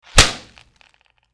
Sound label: Heavy Slap